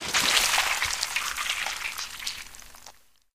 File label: blood spurt, splat; Left 4 Dead 2